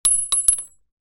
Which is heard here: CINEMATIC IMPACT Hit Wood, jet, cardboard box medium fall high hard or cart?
cart